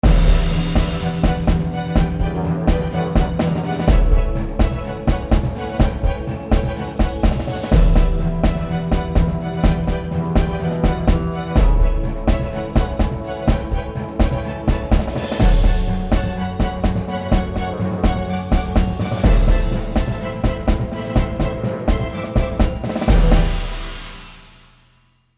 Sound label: main music; RS Games Sounds